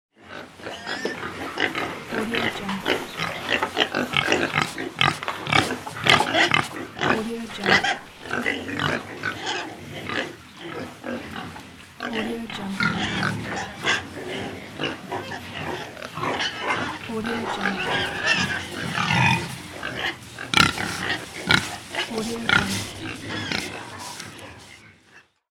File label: pigs